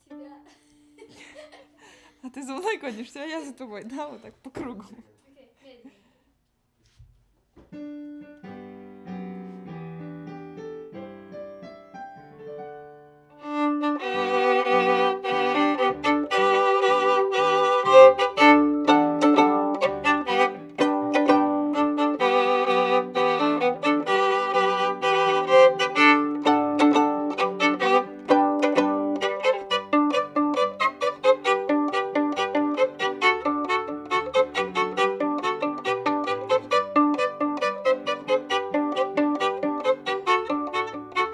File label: Viola C